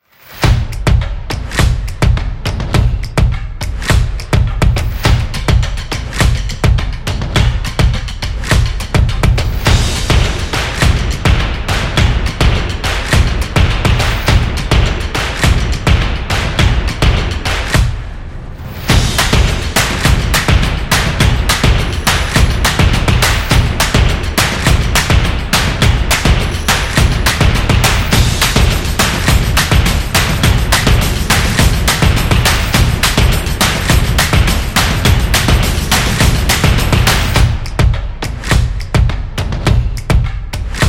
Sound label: Drums Energetic Sport